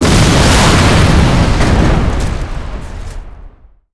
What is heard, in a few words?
explosion; demineur